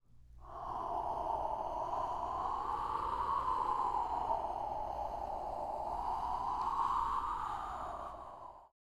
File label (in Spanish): Humo